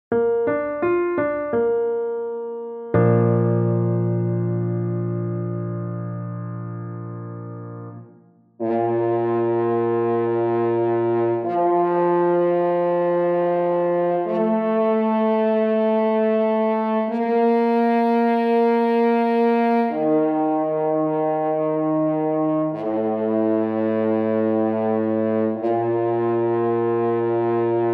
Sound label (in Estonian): Metsasarv